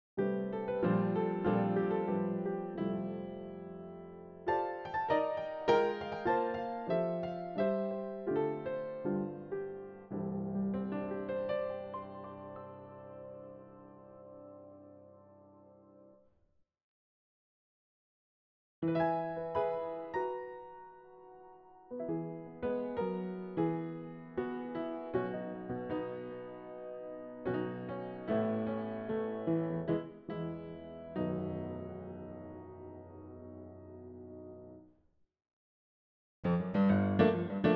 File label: Upright Piano; Kontakt Factory Library